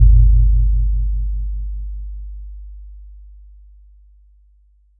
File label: ambient fx, SciFi Ship; SciFiShipController